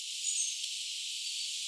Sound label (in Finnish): onko tama sen ihmelajin aani myoskin silloin kyseessa olisi jokin erikoinen rastaslaji